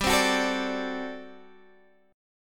G Major 7th Suspended 2nd Suspended 4th G down Guitar Half Step Down; GM7sus2sus4